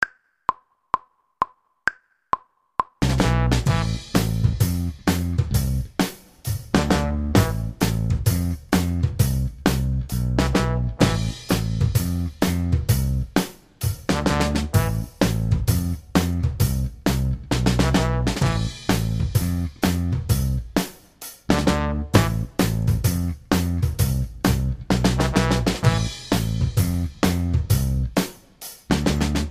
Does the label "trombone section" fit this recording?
Yes